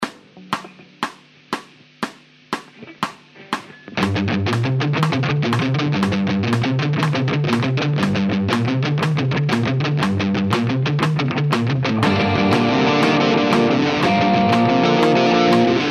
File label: bs riff